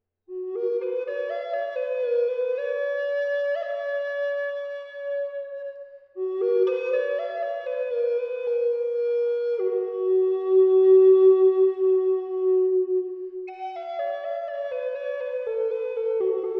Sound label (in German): Feldahorn G 432Hz Ast